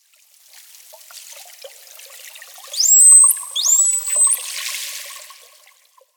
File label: Bottlenose Dolphins